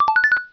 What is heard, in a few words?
notif